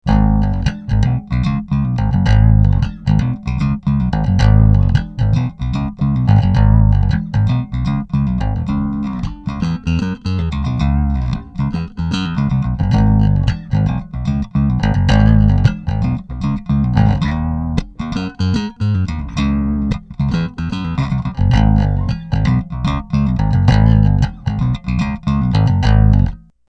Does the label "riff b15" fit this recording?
Yes